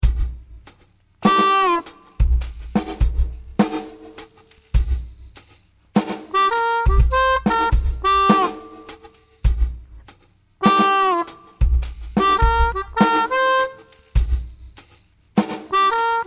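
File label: slow riff, harp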